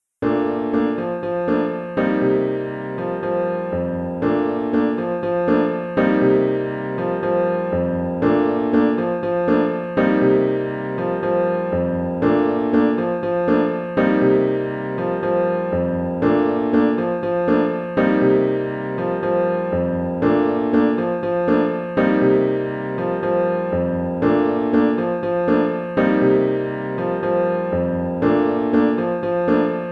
a morning song accompaniment; elementary solos accompaniments

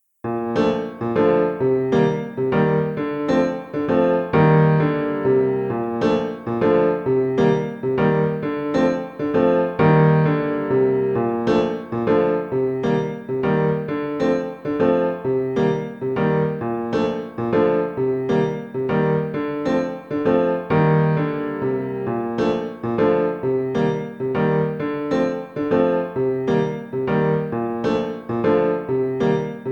waltz accompaniment; elementary solos accompaniments

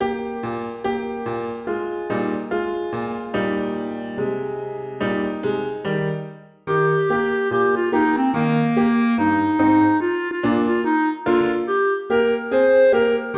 clarinet piano

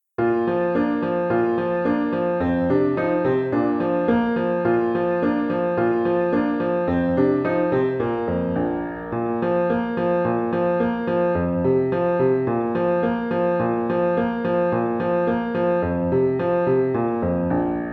allemande accompaniment; elementary solos accompaniments

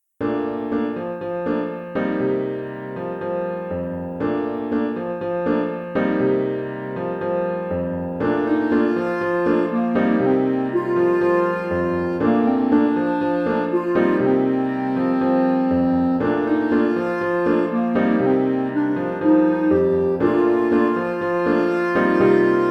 a morning song

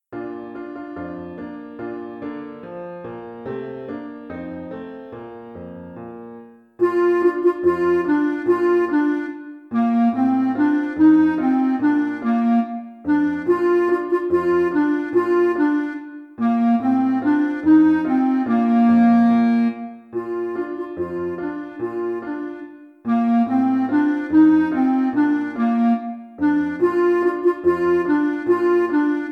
cakewalk

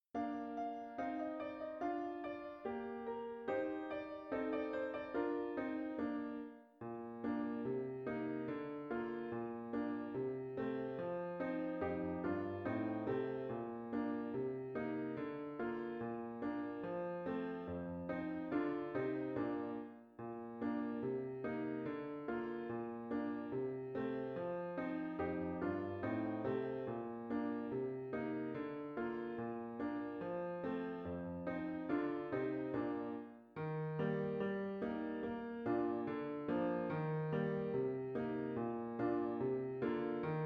the spinners song accompaniment; elementary solos accompaniments

